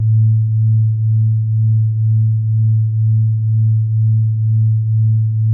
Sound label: A2, synth basses; Music Store Sound Service; Einstein